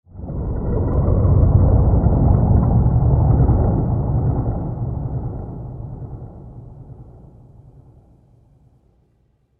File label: Lurking In The Cesspit, evil sound effect; horror; Andrew Fleming